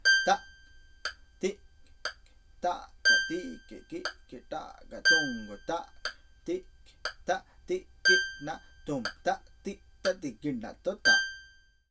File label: tala khanda